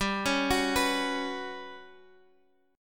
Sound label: G Major 7th Flat 5th G down Guitar Standard; GM7b5 arpeggiated